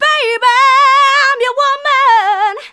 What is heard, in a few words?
Female vocals and robo-vox